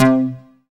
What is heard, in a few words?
K-2000, Synth Bass; BA1 Synth-Basses; Sound & Vision - Gigapack I CD 2